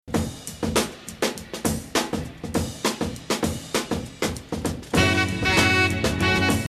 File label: Beat